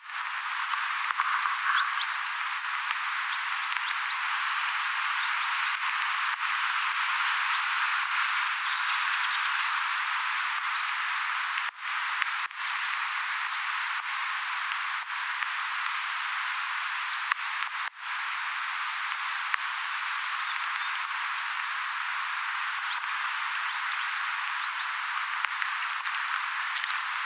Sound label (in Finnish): elämää saaressa